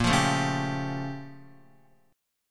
Bb Minor Major 13th, Guitar; BbmM13; strummed; x,1,3,2,1,2; JGuitar

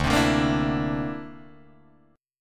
D 7th Suspended 2nd Sharp 5th, Guitar Drop D

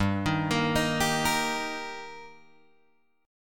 G Minor Sharp 5th G Guitar Open G, chord

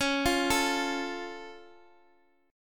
C sharp Augmented, C sharp, down; arpeggiated; JGuitar

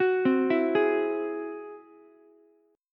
G sharp Augmented 7th G sharp Ukulele; G#+7 arpeggiated; 11,12,12,11; JGuitar

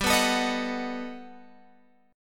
G Augmented Major 9th, Guitar, Open F; G+M9 strummed; 19,18,21,22,21,x

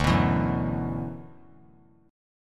D 6th Add 9th D; D6add9 strummed; 14,18,19,16; JGuitar

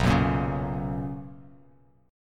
B Major 7th Suspended 2nd Bass Standard, chord; BM7sus2; strummed; JGuitar

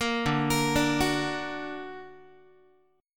D Augmented D down Guitar; D+ arpeggiated; JGuitar

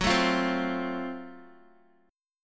F sharp Minor Major 7th Flat 5th, Guitar; strummed

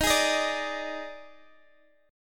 Eb Major 7th Suspended 4th, Guitar, DADGAD

